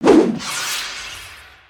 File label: Samurai Ronin Rush